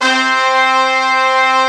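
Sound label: Brass Ensemble, Roland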